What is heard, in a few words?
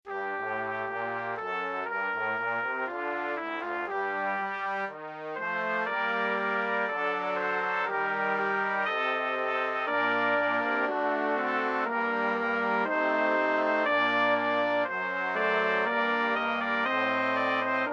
Brass Quintet; Benedicta